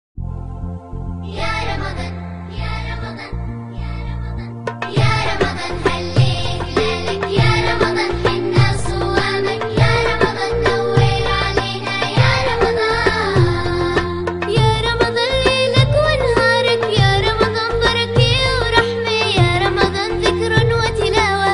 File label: Arabic Ringtone